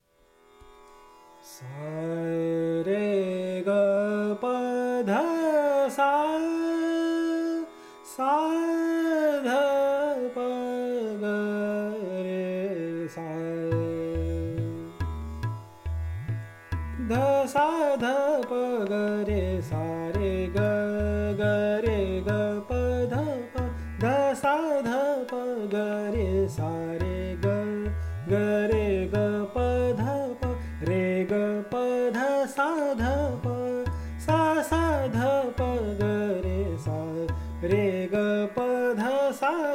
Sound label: Raga Bhoop Vocal; Bansuri Student Zone